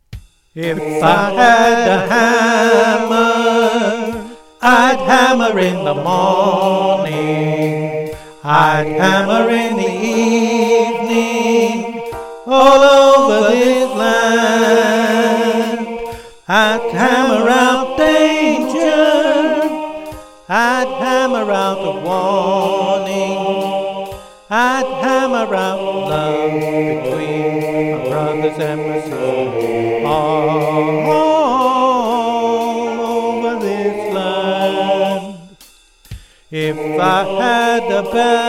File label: swing tenor with vocals